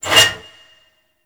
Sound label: Frame Movement; DE; Warframe; Vay Hek